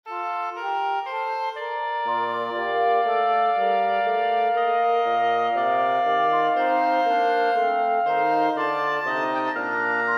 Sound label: quartet